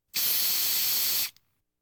Household Phones

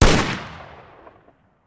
Rifle; Sound Ideas; Guns

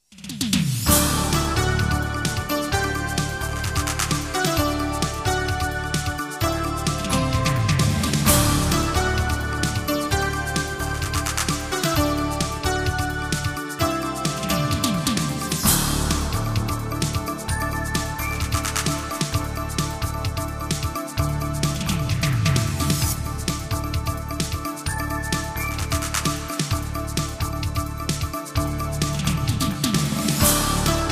Jingles; 90 DDD Jingles

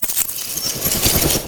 Ice Spell Power Up Silence; Arctic Aura

